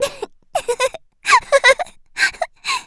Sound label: laugh; Eternal Return; Tia; Voice Line; KOR